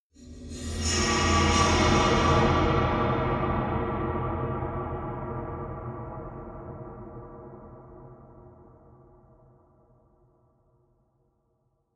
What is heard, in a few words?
metal bright reverb; maschinen; soundpool